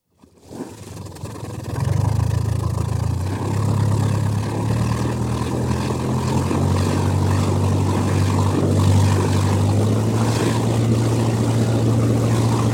Cabin Cruiser, stern-wash, start to cruising speed, constant run, stop (Recorded on board) (27ft, 50hp diesel engine); Boats; BBC